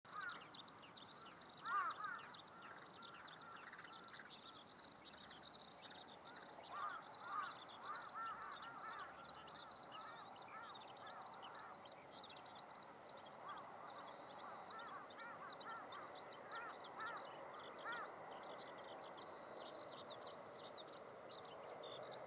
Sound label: skylark